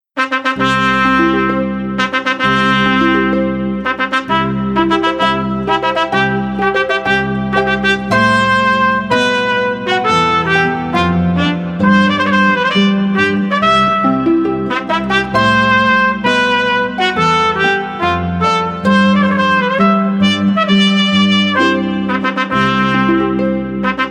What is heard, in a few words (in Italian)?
Marcia Nuziale, ARPA TROMBA; ENTRATA SPOSA; F. Mendellsohn